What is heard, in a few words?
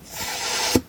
thwoop; hoverball